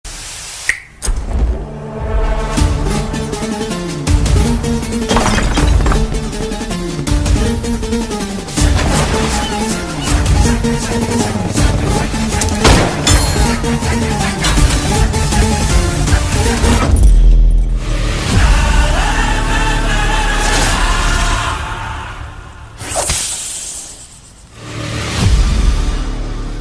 karthikeya trailer bgm; nikhil; anupama parameswaran

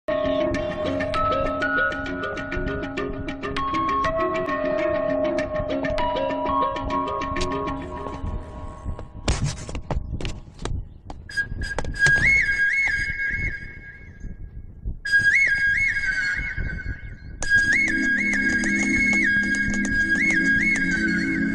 bike bgm; polladhavan; pulsar150 bike

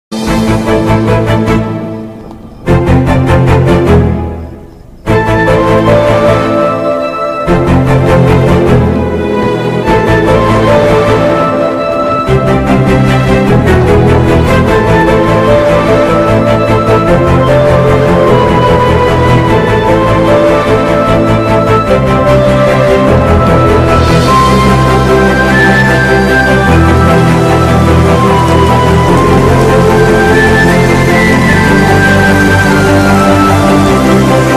love theme bgm ringtone; dj tillu movie

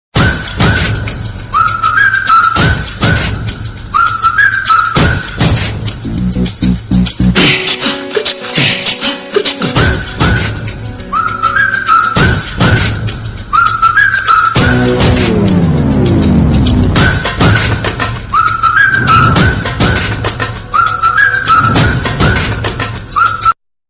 whistle bgm ringtone; dhoom

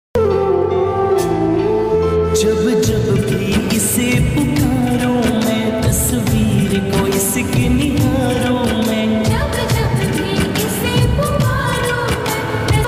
bhakti ringtone krishna bhajan ringtone; radhe krishna

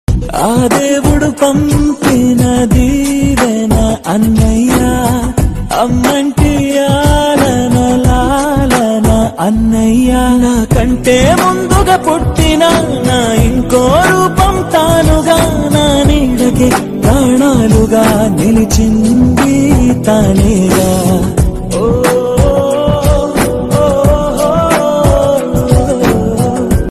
emotional song telugu emotional ringtone; annaya

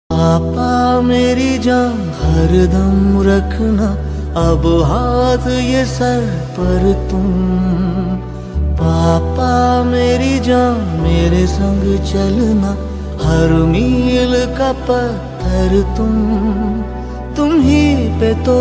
song ringtone

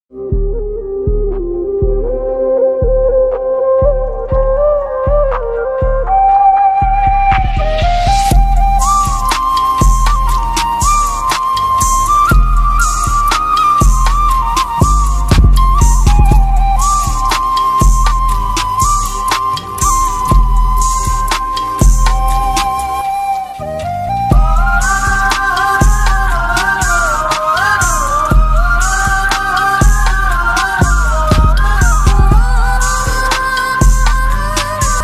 tamil love ringtone

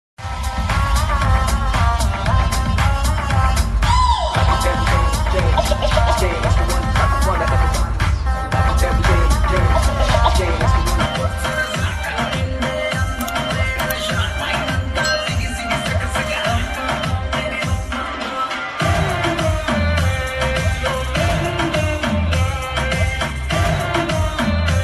entry bgm ringtone; anupama; tillu square movie

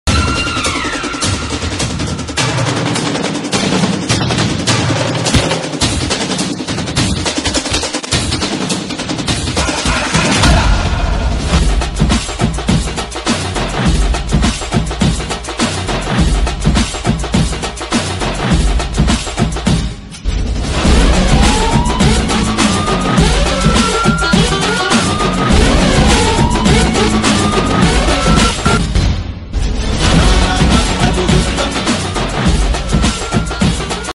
kalki nasik dhol bgm ringtone; tovino mass

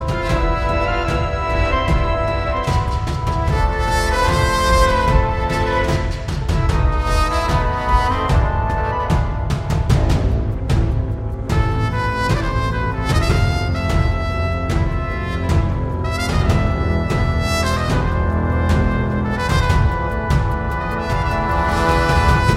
Theme Music BGM; Karnan

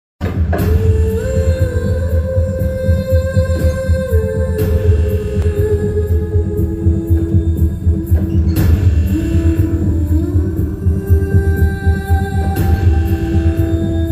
sad bgm; Khiladi; south movie

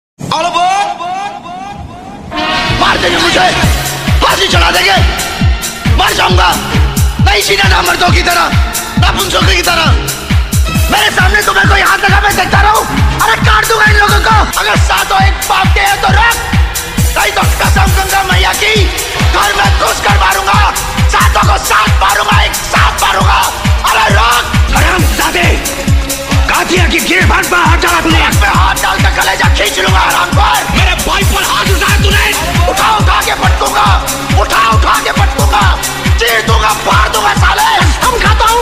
phone ringtone

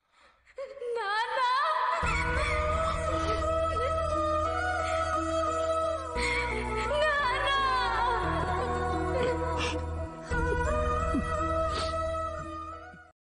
emotional ringtone; nanna ku prematho

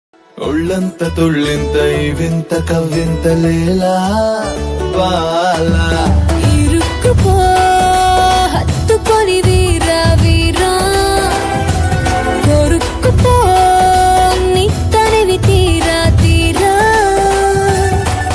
beat box; baahubali the beginning movie

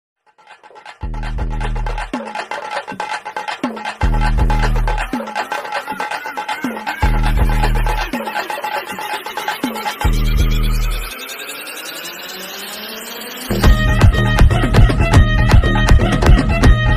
climax fight bgm ringtone tamil bgm ringtones; maaveeran

